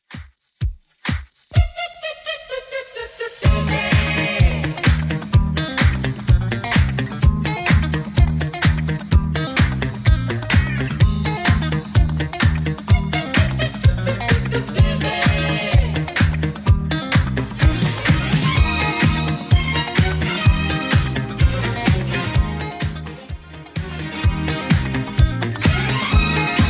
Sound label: 80s Redrum, 127 bpm